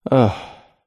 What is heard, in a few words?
human male sigh satisfied exhale